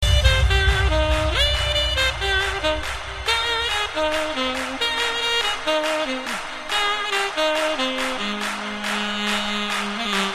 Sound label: Sax